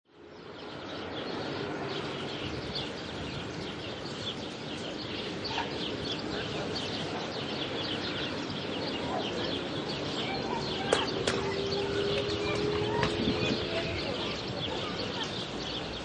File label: Country Ambience Voices, Outdoor at Cypriotic countryside; Birds tweeting, distant cars, small dog and faint voices.